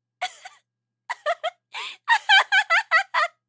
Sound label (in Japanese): warai kowa